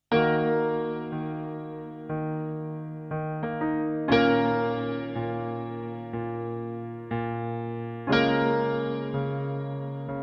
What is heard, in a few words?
goodbye piano